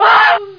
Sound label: player burn; Quake